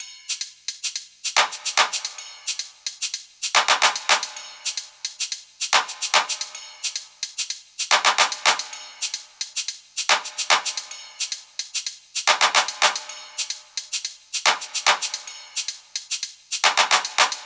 perc 110; Drum Loops; Top Loops; SD MIDNIGHT Sample Pack; Slate

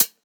hat close, drum one shots; Drum Sample Pack; Hats; Slate; SEISMIC

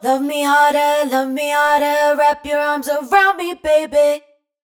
hook 110 Gm wet, Vocal; Slate Digital Omnivox Vocal Sample Pack

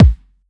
Kick, 909 Kicks; Slate; Vintage Drum Machines Volume 3 909 Edition

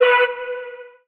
vocal chirp B; Slate; AURORA Sample Pack; Vocals

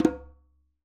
djembe Single Hit Flams; Raw Perc One Shots; Slate; SD SEISMIC Drum Sample Pack

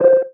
tonal one shot underwater C; Pop; Slate; PASADENA Pop Sample Pack; Tonal One Shots

